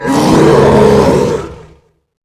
golem low health

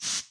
spray

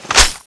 clip in; tfa cso; x-7

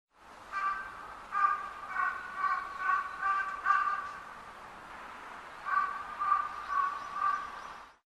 raven; soundscapes

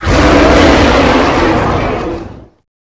dragon voice

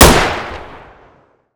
galil; hk g3; weapons

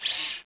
idle, weapons; cso

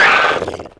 injured

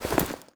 shove

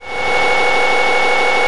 minigun wind up; weapons

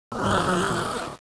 idle, weapons; tfa cso; crimson hunter

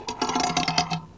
box in; weapons; fluence

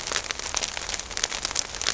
idle, weapons; tfa cso; petrol boomer

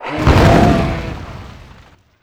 attack

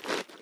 eat

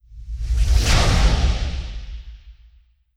blowout flare

